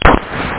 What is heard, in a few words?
thunder